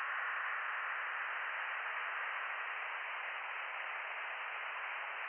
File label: from radio, 7177KHz